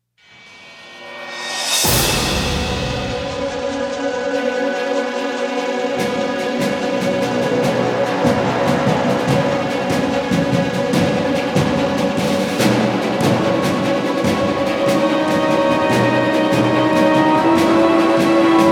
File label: Combat Dogfight